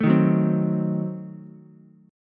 Gb Diminished 7th Ukulele; strummed; JGuitar

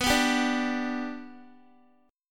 B-Minor Sharp 5th, Guitar; strummed

